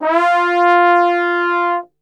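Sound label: F 3 trombone gliss, Brass; Best Service; Brass Super Section; TRMB GLISS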